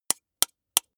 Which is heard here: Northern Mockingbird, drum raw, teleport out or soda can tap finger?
soda can tap finger